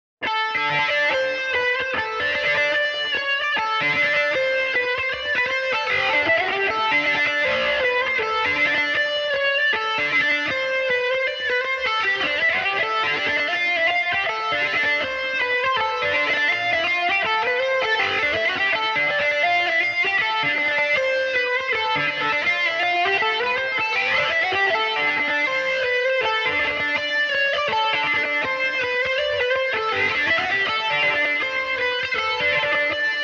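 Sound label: A dorian belmuse, irish mandolin; The Ace Of Spuds; "dirty" amp version; with layers of distortion; Belmuse EM-190 electric mandolin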